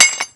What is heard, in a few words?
Pick Hit, Shatter Stone; NV3D